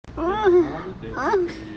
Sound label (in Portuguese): gemido